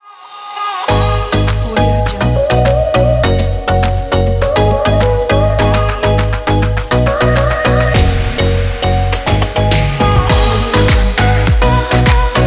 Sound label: house party lifestyle logo